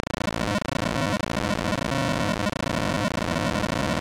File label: engine